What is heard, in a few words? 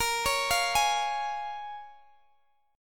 A sharp Minor 7th, A sharp, down, Guitar, Open D